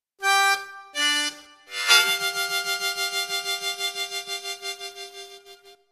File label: Tongue, harmonica